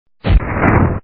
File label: Missile